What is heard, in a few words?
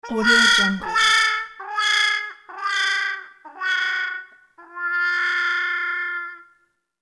trumpet wah wah